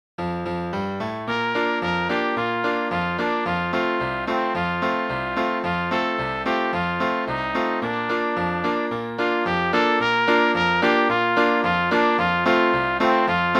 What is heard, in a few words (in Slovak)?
Vánoce, Vánoce - pre trúbku a klavír